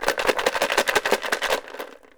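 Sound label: ELM RATTLE, PERC; AKAI S6000 CD-ROM - WorldSounds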